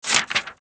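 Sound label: Page turn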